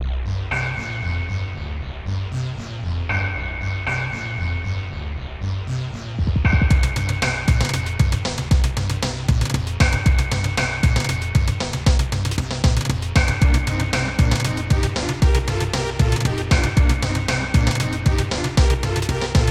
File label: Weapons Factory, Song